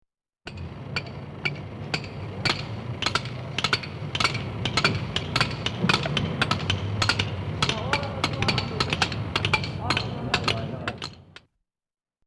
Construction, Chisel Several People Chiseling Rock Outdoors